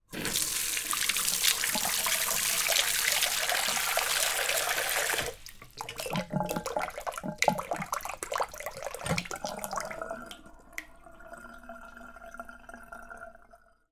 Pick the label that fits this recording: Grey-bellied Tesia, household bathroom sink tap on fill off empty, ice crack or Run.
household bathroom sink tap on fill off empty